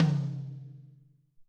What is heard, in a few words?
Raw Md Tom, Alesis SR-18